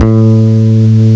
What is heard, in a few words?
ELE BASS; M8; CMI Factory